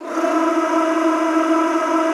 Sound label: E3, Extended Classical Choir; Best Service